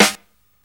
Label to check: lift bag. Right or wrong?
Wrong